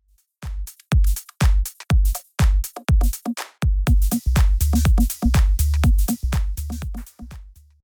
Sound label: ghost kick